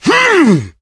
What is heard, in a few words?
melee swing, player survivor voice